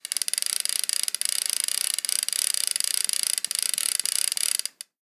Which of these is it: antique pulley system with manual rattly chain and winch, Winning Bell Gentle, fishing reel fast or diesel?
fishing reel fast